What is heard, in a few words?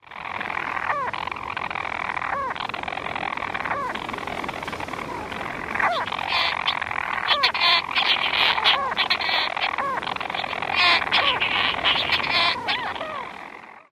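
colony calls, bird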